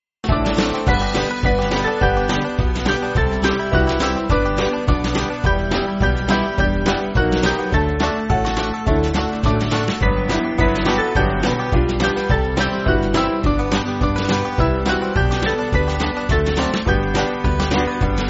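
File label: Band, Backing Files for Churches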